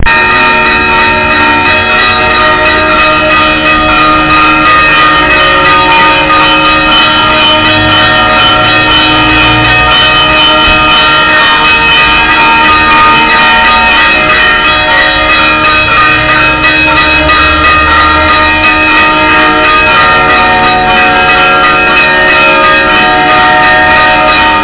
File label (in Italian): campane; blasius